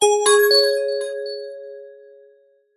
multimedia bell chime alert synthesised positive

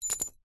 household padlock small set down on carpet; zapsplat

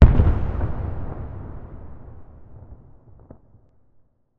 explosion distant; sound effects one

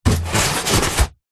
foley polystyrene sheet handling hand movements; zapsplat

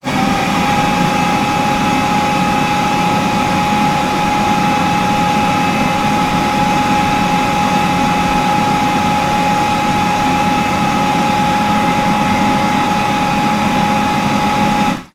public places hand dryer public toilets operating; sound effects one

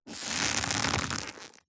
foley leather thick creak; zapsplat

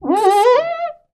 household window glass rub squeak single; sound effects one

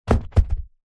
foam rolled up camping mat drop

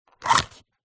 household aftershave card box open; zapsplat

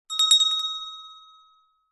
bell small hand ring short weak

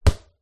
sport soccer ball bounce in small internal space

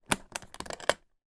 foley flightcase drawbolt catch unlock

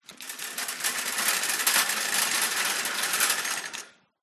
shopping cart; the sound pack tree

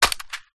impacts plastic vhs cassette tape

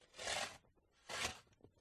shovel clearing snow from path; toolmisc; field reports

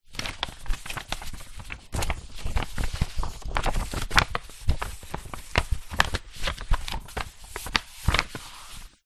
paper several sheets movements; foley

